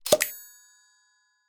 Notification

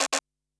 Notifications and Buttons

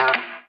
Notifications and Buttons

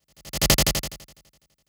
Strobe Transition

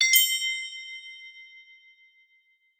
Confirmation

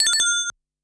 Game Sound

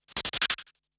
Strobe Transition

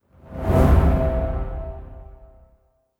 Simple Transition

